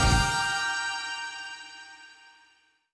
Race Stop; Summer Catchers

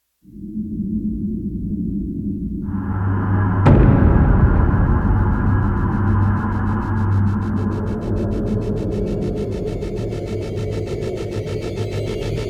COMBAT THEME

